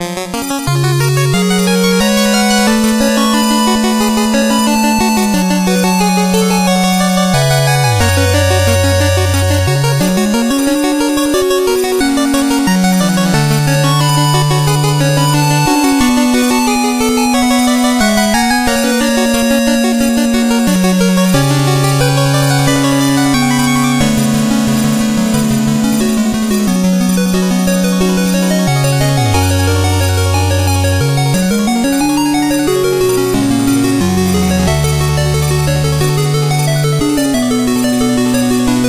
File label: Music Campfire; Shovel Knight King of Cards